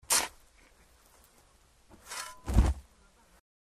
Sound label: shovel; farm